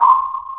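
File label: sonic pulse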